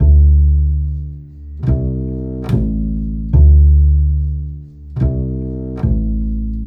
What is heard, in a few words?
Acoustic Bass